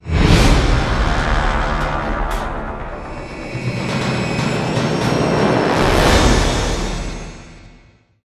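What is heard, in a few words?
STINGER MATRIX OF LEADERSHIP 2X HIT, soundtrack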